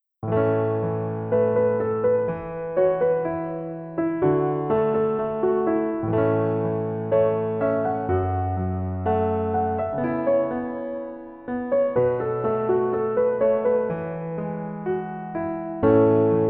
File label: Piano